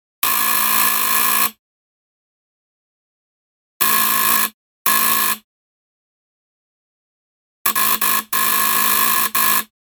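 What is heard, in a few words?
doorbell sound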